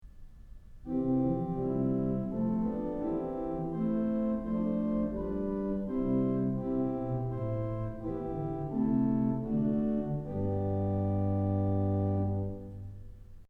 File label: Diapason; Kellogg Auditorium